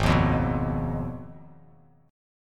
Ab Minor 6th, Abm6 chord, guitar; Abm6 strummed; JGuitar

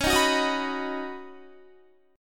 Db 7th Suspended 2nd Suspended 4th Db Guitar; 20,18,18,20,18,19; Guitar-4F,4A,5C,5G,5C,6E; Db7sus2sus4 strummed

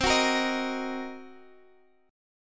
C Minor 7th Flat 5th, Guitar, Half Step Down

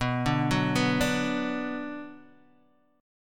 B Minor B Guitar Open D

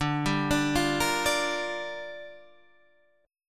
Bb 6th D

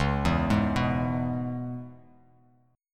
Db Minor 7th Db, Bass Standard; arpeggiated; JGuitar

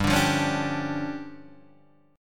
D Minor Major 7th Double Flat 5th, G

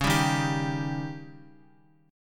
Db Suspended 2nd Flat 5th Db down Guitar; strummed; JGuitar

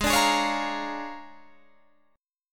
Bb 7th Sharp 9th Ab

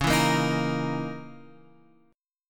C sharp Minor 6th Add 9th; x,x,x,6,4,5,5,7

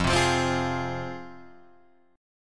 F Minor F down Guitar Open G

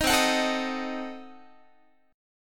C Diminished 7th down Guitar; Cdim7 strummed

